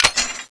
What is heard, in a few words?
gatling clip in, weapons